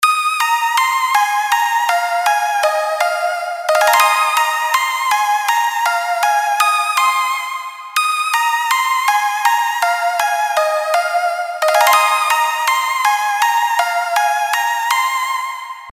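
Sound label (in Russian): звонкая мелодия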